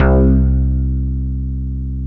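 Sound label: finger bass; Timidity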